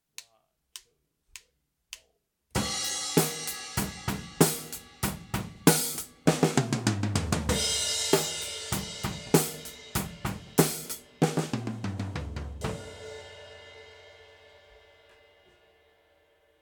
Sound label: EQ Overhead; Drum Recording Setup